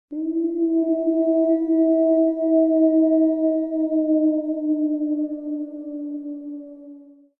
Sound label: Lone wolf howling